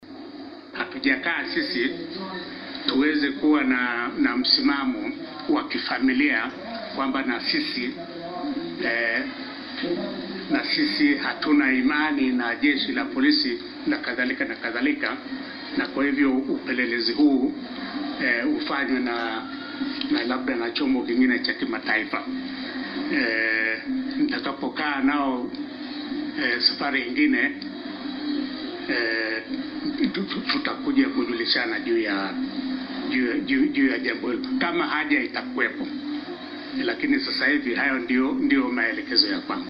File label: lute